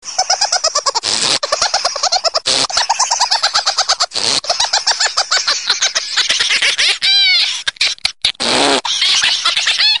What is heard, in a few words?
Amusing Laugh